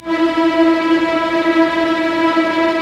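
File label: STR SEC, strings; STR ORC FX; Roland - LA Composer vol. 1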